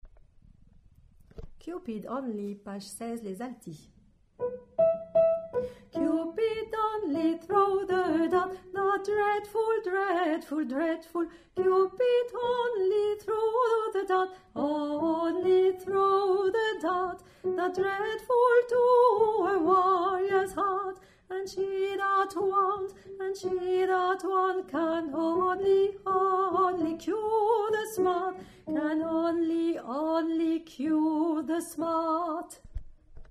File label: alto